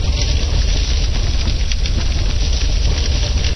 Engine on fire; 暴力赛车

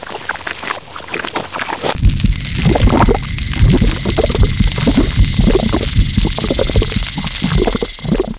Water, 水声; 特酷声音素材库

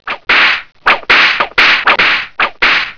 Fight; 特酷声音素材库

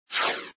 windows empty trash; FlashChat v3.931

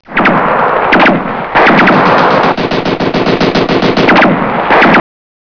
SHOOT, 射击; 特酷声音素材库

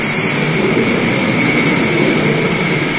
Plane; 暴力赛车

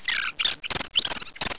Bird; 特酷声音素材库

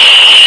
Shriek; 暴力赛车

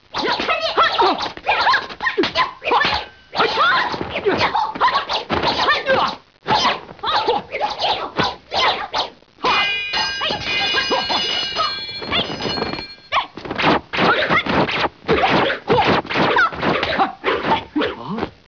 Fight; 特酷声音素材库

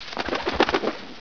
Bird; 特酷声音素材库

